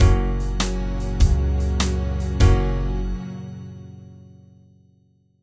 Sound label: Country ident; Music Loops; Kenney's Sound Pack; Idents